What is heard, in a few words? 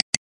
Mouse Click Sound